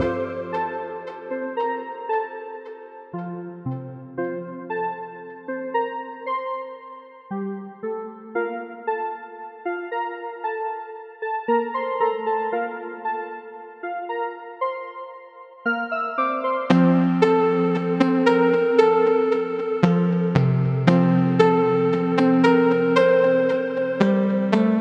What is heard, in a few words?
Cute RPG, Loopable; przygody-pana-cegly